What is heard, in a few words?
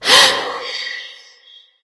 breath light; smite; voicepack; hel